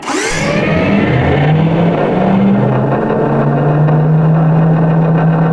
door move

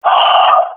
death, swat fem; Counter Strike Model Pack; CS:GO

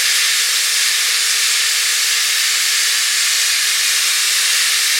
amb steam, ambient atmosphere

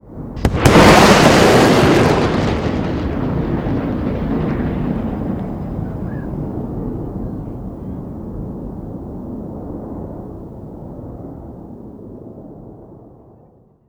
jet sonic boom; animation jets; Counter Strike Model Pack; CS:GO

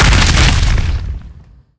body impact hard, antlion; creatures

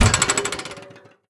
bounce, wheel

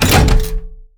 turret takes damage; Counter Strike Model Pack; CS:GO; survival

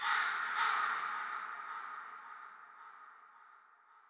crow, ambient, nature, birds; Portal 2

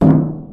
metal box impact soft; physics

